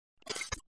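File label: signal glitch; افکت صوتی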